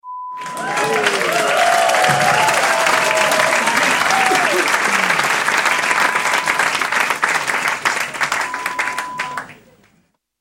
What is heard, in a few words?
Applause sound